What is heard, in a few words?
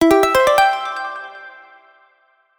1-Up; MvsDK; Switch